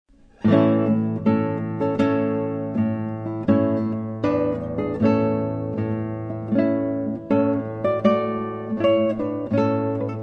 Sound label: Bridal Chorus Solo